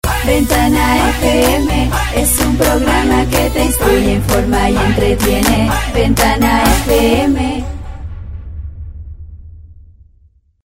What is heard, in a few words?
jingle; madys